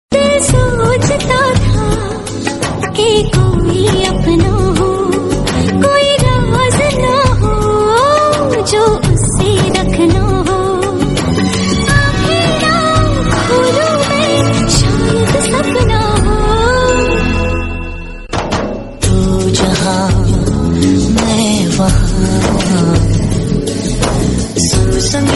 Female

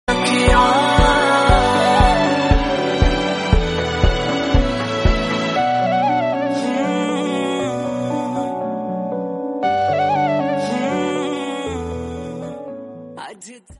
Instrumental Bgm

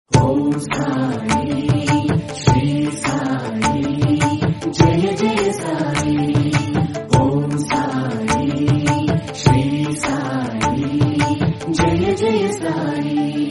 Telugu Bhakti; Shirdi Sai

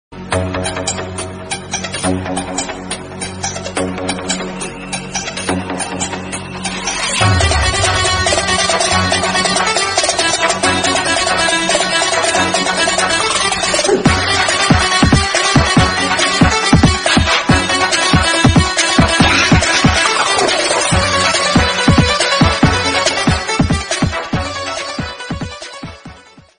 Instrumental BGM

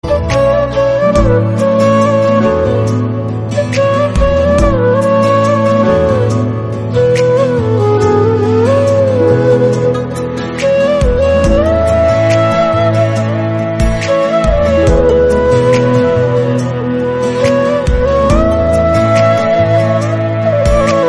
Instrumental

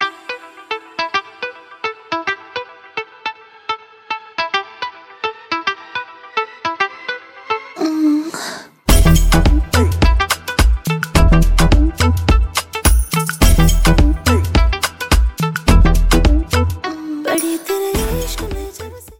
BGM Instrumental

